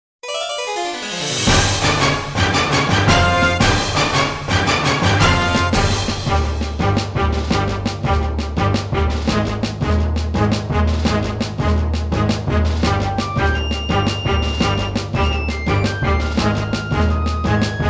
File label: BATTLE; Favorite Dear